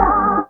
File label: fx vox; E Lab; XTc Files of House